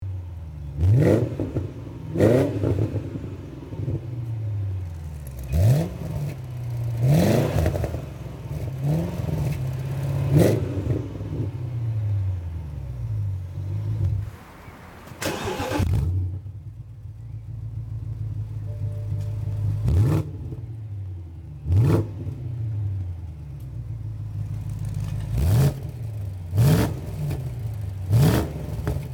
BMW 650i

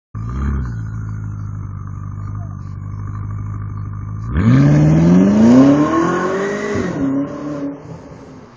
BMW X6M

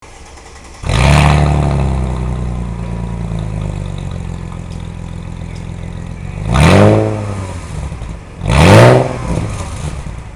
Mercedes SLK 55 AMG

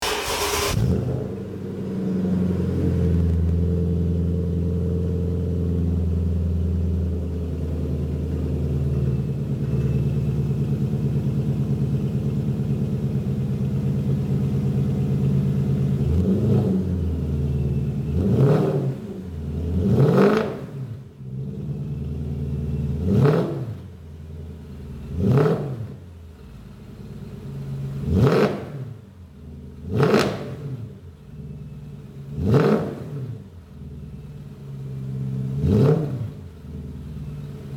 Mercedes CL500

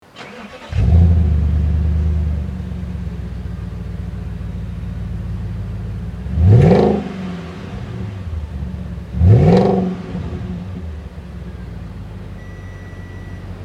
Audi A8 4.2

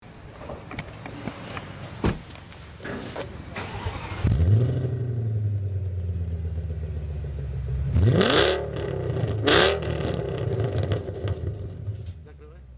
Audi S8

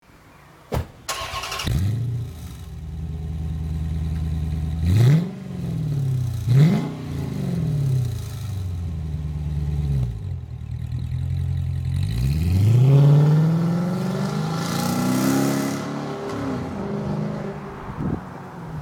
Dodge Charger Sound Exhaust

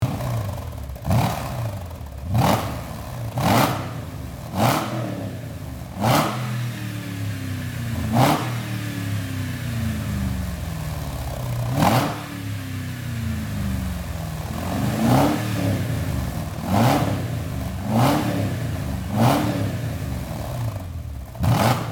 Mercedes Benz E 500 W211

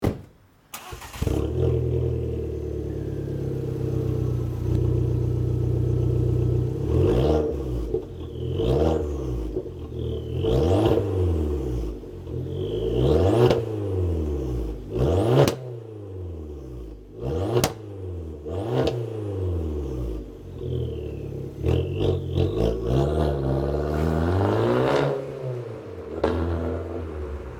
Fiat Punto Abarth

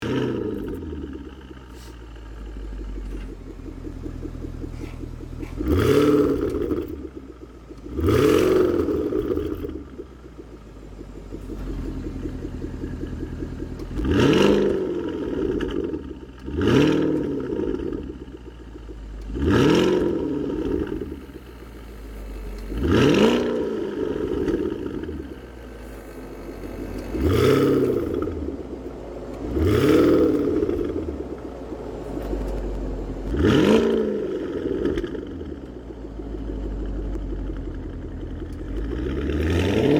Porsche Panamera Turbo

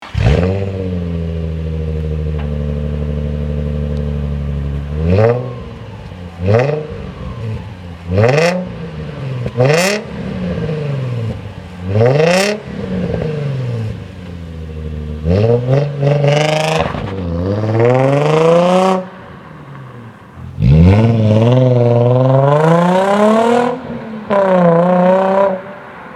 Nissan 350Z

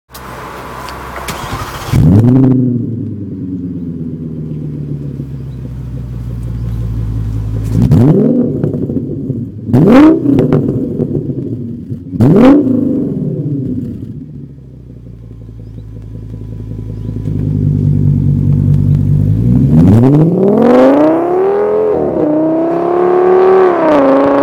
Audi S8 V10

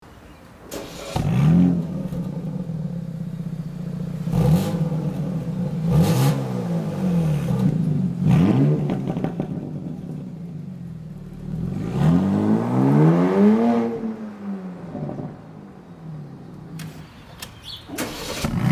Porsche Cayenne GTS

